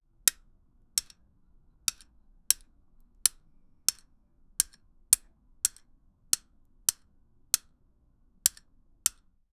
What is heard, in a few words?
cranking a crank, ratcheting